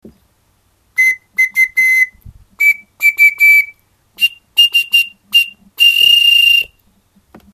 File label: straw whistle g